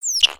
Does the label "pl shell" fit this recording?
No